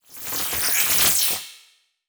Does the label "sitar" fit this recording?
No